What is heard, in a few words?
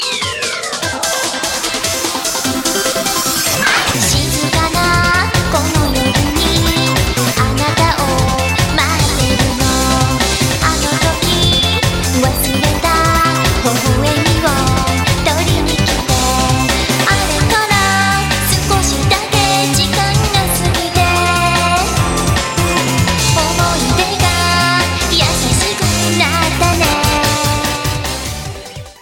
Live Concert